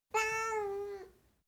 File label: Siamese, Individual Meows, Cats; BBC Sound Effects Library